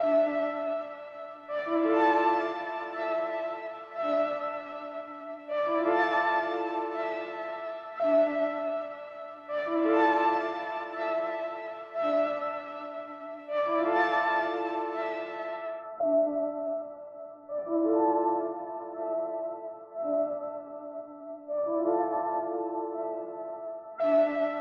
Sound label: 120 BPM D Min Synth; Cymatics; Destiny Gold Expansion; Myst